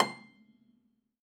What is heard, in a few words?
Rubbery Piano, prepared piano; Total Piano; Rubbery Piano 2 -ped